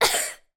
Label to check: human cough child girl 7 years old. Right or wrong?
Right